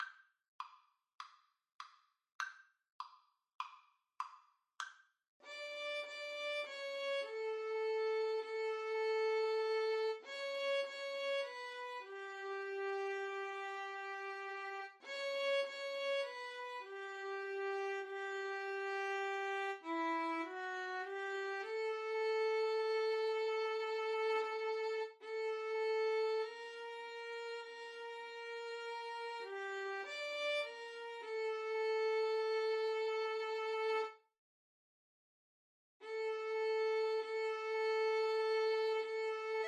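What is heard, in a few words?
violins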